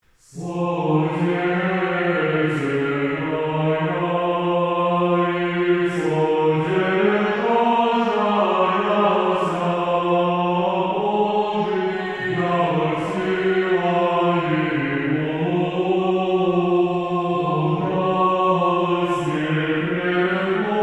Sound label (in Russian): Успение 1 глас ирмос 3 песни; Содетельная